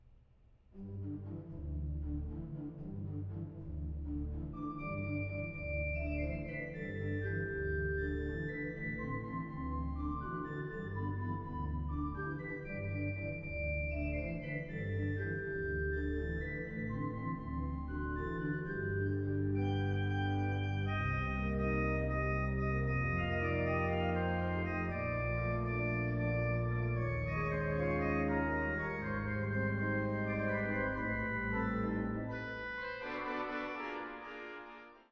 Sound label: caribbean dance